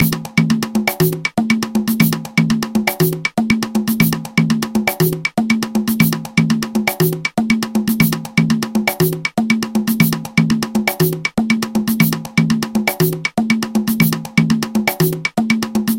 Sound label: guaguanco matanzas